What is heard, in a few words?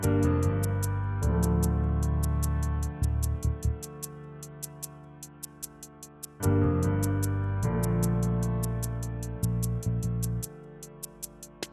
smooth groove; Jamie Coleman, Film Composer